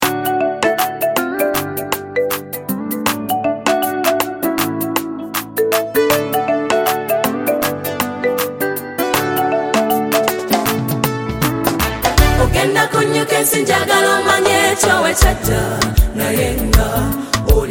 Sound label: Bwerere, Choir; Streams of Life Choir Kennedy Sec School